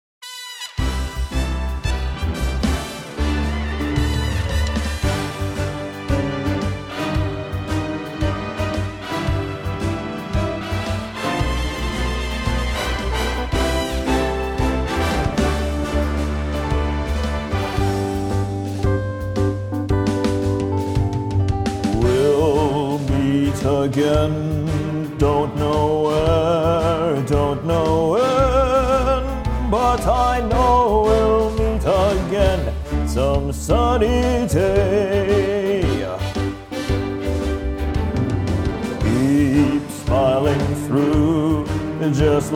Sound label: Big Band Jazz Cover